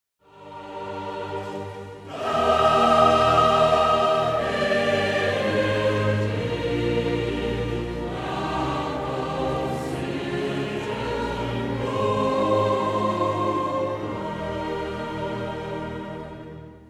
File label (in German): Chor; Erntefeier; Mein Leben ist ein Pilgrimstand